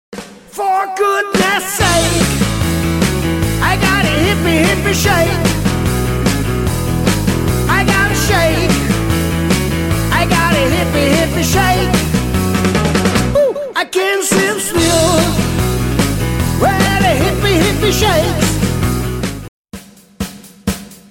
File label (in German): beat hoerprobe